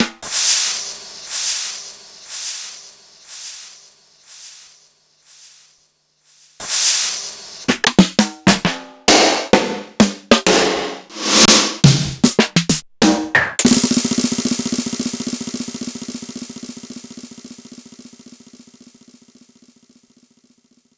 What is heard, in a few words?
Korg 05R-W Korg Snares